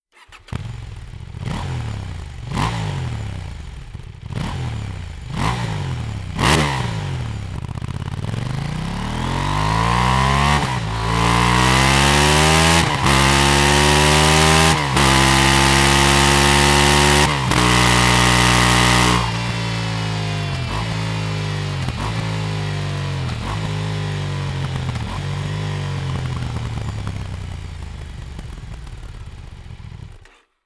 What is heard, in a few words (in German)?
Sound Serienschalldaempfer, 890 Duke; EURO 4 und 5; Remus NXT